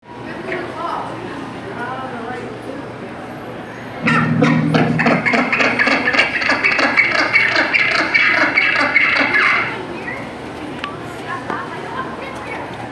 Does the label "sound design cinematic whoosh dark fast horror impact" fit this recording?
No